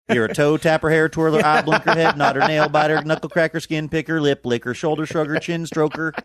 singing youre a toe tapper hair twirler eye blinker